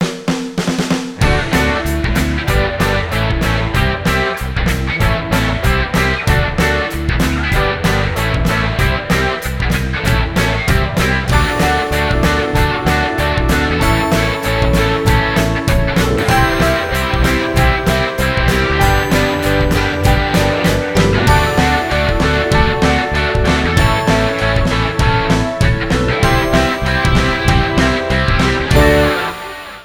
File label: Rock Positive; think positive